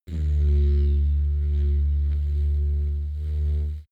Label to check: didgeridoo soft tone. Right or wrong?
Right